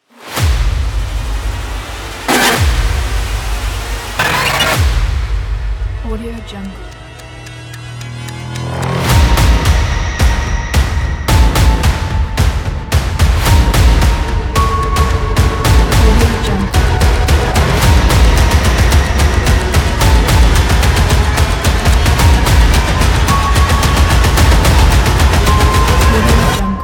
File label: crazy horror teaser